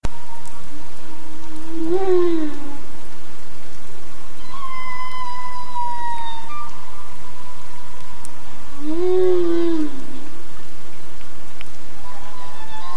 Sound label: glacier bay humpback